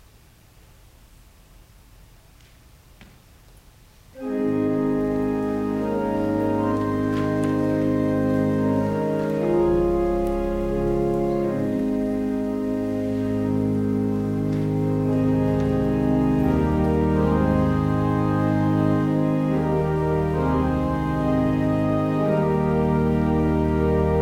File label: Organ Music; The Lord's Crowning Goodness; psalm 65 11